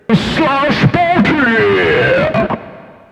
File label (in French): JINGLE; slachPARTY